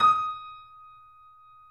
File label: Yamaha, 3D Pianos; E-MU Producer Series Vol. 5; 3-D Audio Collection